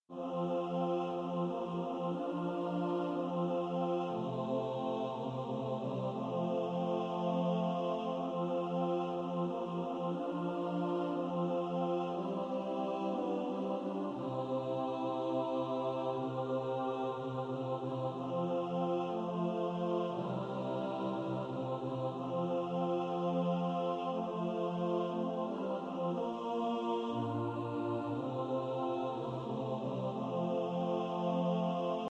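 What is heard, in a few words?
alto, practice music, SATB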